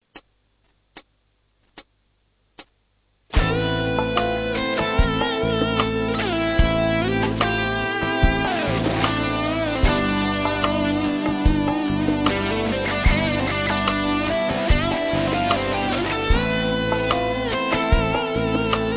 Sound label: solo; penta impro